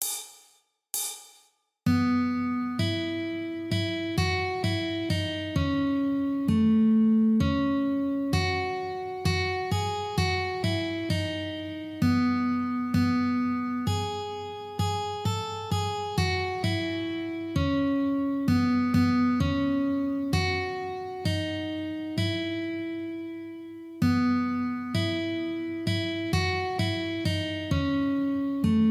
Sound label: We Wish You A Merry Christmas Easy 65bpm